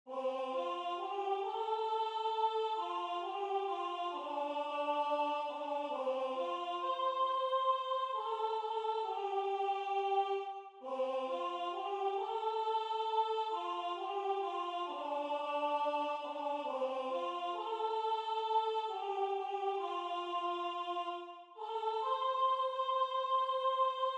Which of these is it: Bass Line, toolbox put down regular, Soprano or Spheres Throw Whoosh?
Soprano